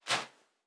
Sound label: Footstep Sand Walking